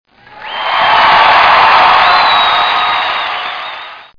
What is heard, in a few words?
success; TerrapinFTP